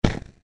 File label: cannon shot